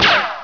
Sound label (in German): schuss; erschossen